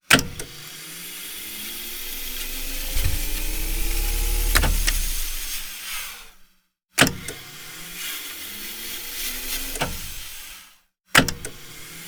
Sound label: Tape machine fast forward stop quick; rode; SkewSound; reel2reel